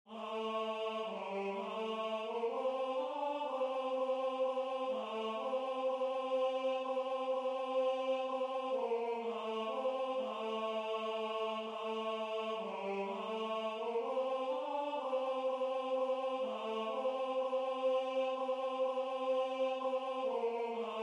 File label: Tenor